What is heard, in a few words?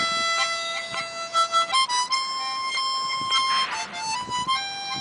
Harmonica